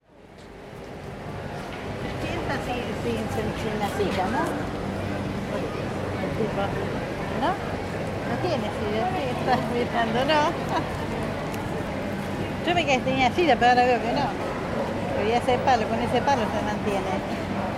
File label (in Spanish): recorrido peatonal cordoba, rosario